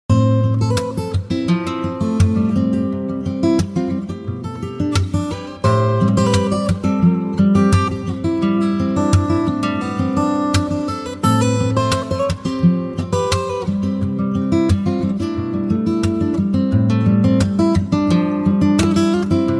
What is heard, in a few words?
acoustic guitar ringtone